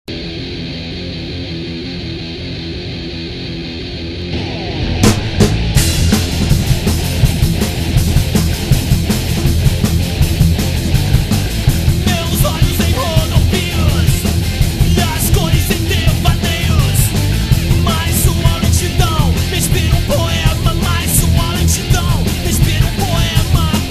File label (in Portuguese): sex noise rejeicao